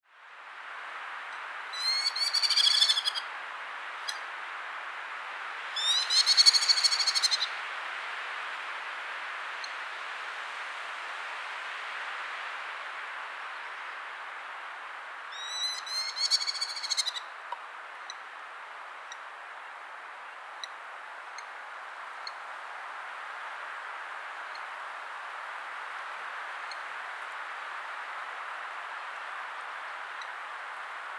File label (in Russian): derbnik golos